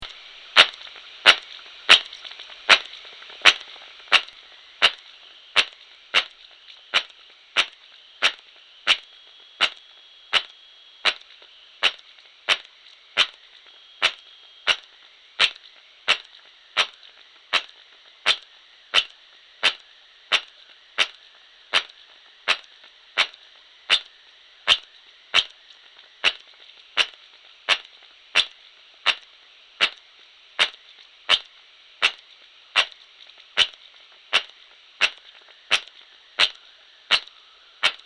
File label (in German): Zwerg Balz